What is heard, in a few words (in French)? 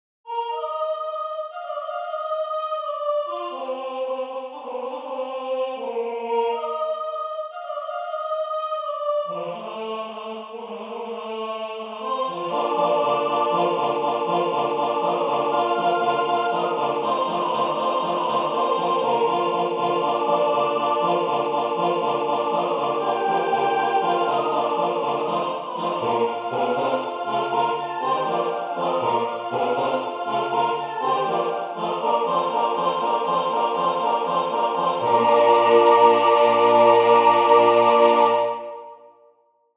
soprane; chorale